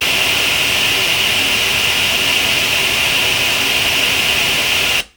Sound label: pink noise